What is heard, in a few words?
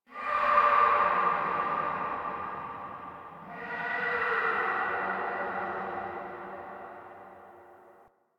Interior Texture Aggression Calls; Warframe; Infested Eris; Misc Indoor